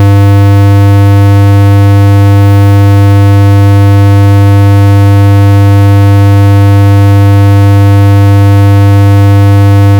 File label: potence power dark energy